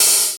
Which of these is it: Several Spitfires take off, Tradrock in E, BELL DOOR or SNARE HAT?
SNARE HAT